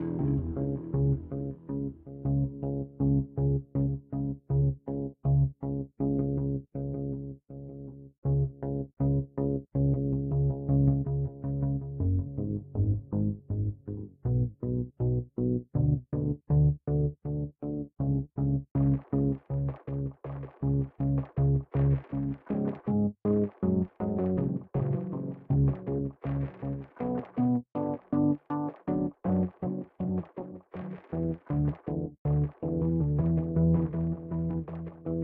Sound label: Mix Stem Layer